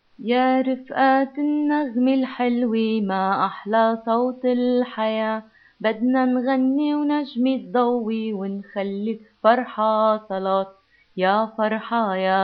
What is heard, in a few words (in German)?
melodie